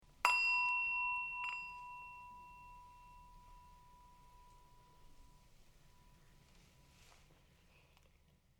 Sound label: Singing Bowl